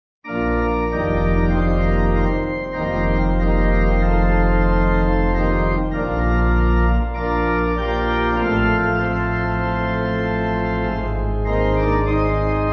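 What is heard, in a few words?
Pipe Organ, Music Backing Files for Churches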